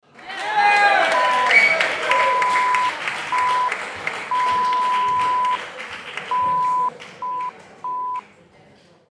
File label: Applause 2 Whistle